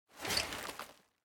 armor; Smite; god Kuzenbo

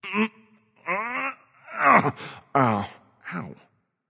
nv grunt lift; cernunnos

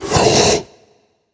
breath; smite; anhur

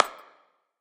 clap; smite; poseidon

